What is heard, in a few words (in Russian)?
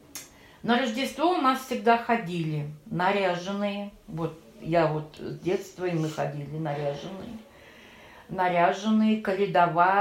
Каляда, с. Воднобуерачное Камышинского р-на Волгоградск; Воднобуерачное Камышинского р-на Волгоградской обл